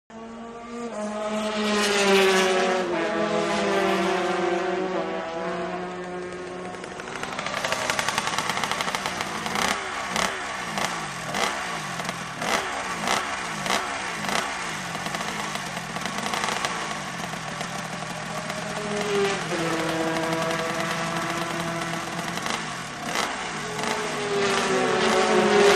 Motorcycle Pit Amb, Motorcycle Road Racing; Pit Ambience 750